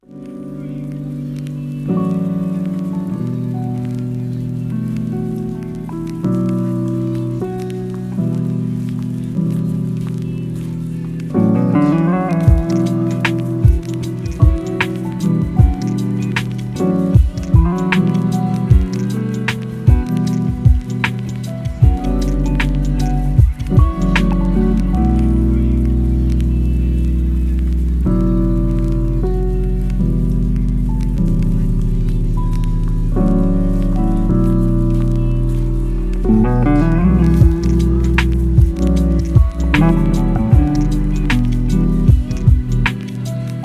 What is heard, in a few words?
lofi hip hop